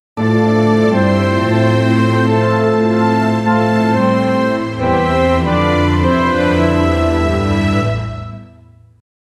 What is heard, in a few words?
light comedy mixed with j, melodies